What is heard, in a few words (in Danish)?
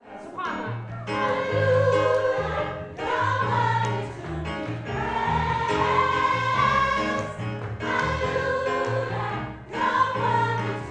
sopran, kor